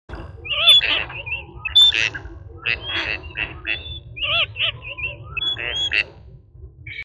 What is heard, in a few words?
Male and female migratory ducks; مرغابی های مهاجر نر و ماده